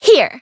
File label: attack